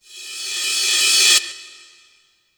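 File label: Kawai K5000W Reverse Cymbal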